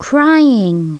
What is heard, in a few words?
CRYING